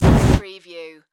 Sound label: Flame Thrower Burst